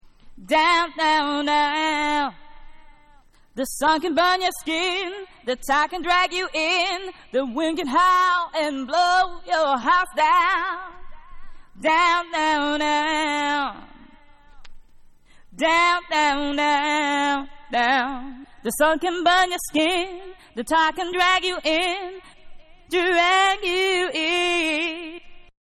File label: Acapella